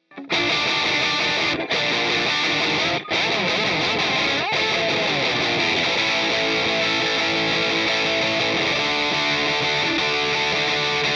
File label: guitar, 171bpm